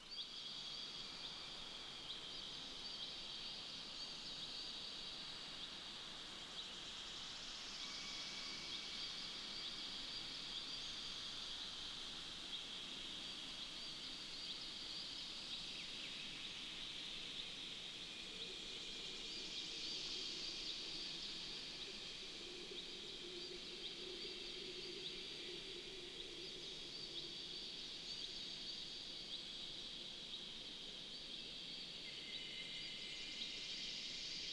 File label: ambience General; netent